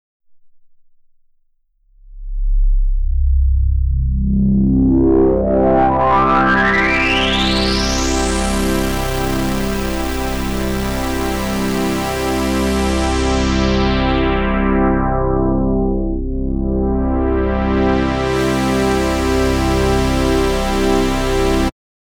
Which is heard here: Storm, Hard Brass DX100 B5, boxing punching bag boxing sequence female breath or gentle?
gentle